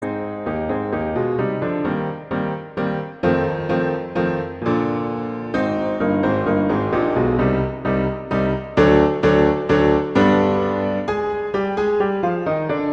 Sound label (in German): Walzer; Schumann; Albumblatter